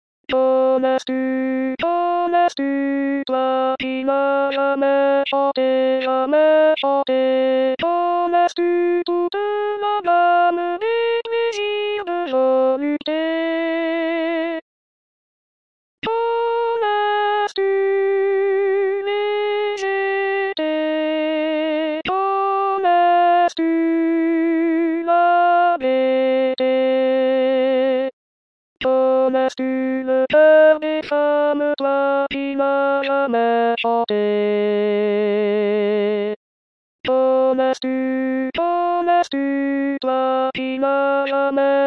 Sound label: Alto